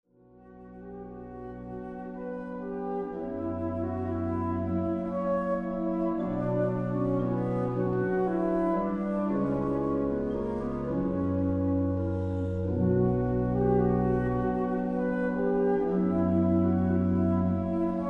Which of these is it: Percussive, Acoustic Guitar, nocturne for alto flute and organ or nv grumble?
nocturne for alto flute and organ